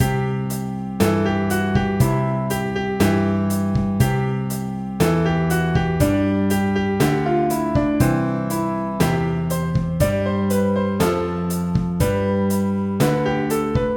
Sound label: Heads Shoulders, keyboard